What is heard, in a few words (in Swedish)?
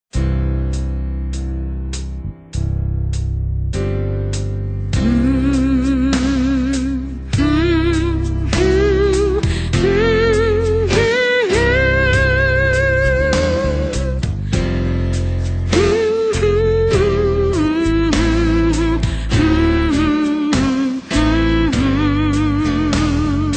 sopran